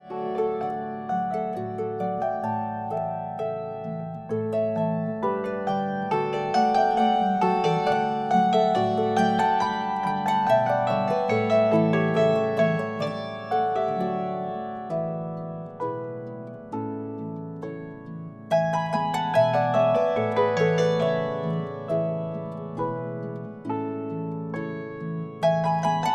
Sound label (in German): Boarischer